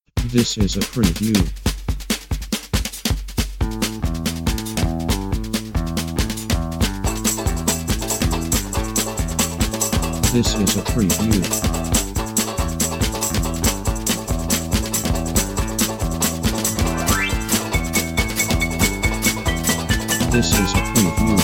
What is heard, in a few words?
Street Band